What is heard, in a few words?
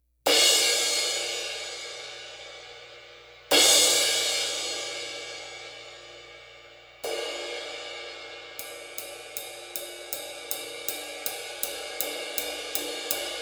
21 A Zildjian